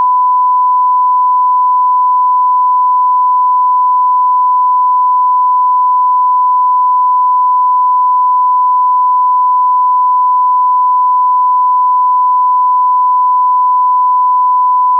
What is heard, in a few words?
sine 1khz stereo